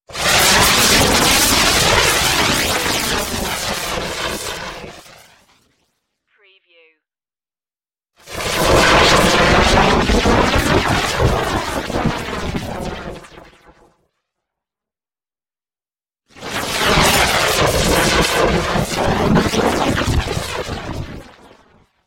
jet impulse bursts

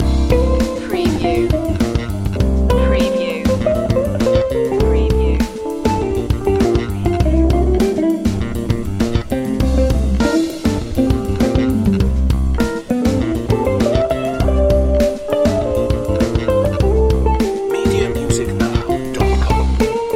funk cake loop

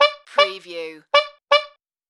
Toy Car Honk

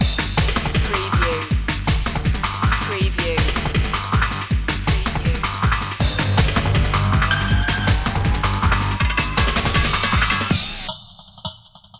shock load loop

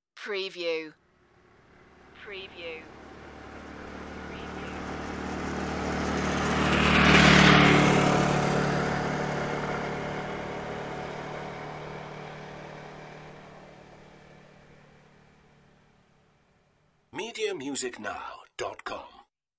motorcycle passing

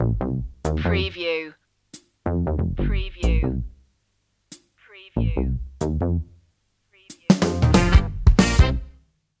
funky jazzy stinger

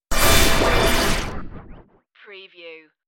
wobbling space turbulence

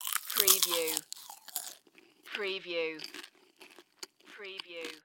Chew Popcorn Long

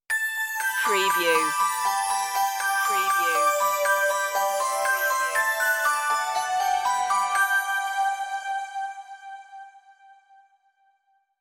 merry christmas synthified stinger intro

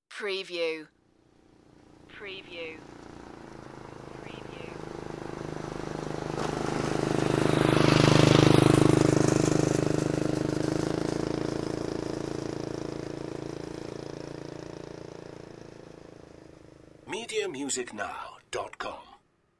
scooter passing

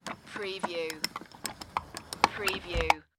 Window Close Lever; Sound Effects Bible

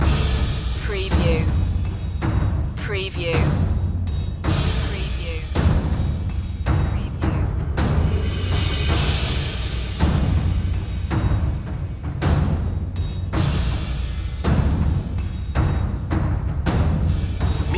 percussion loop

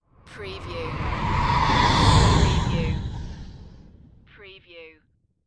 Spaceship Pass By

